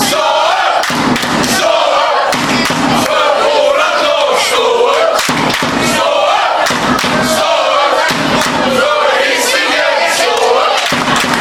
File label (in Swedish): stand hinsingen fanchants, sweden